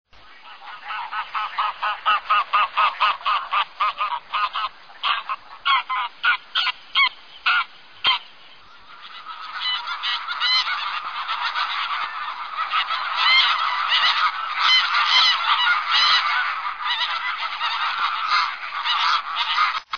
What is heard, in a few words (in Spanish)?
canto Ansar Comun